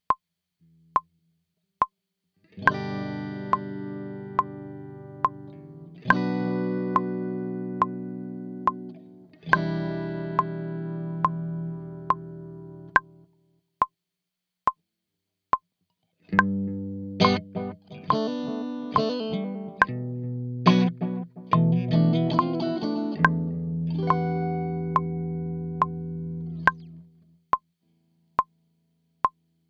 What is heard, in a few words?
Lick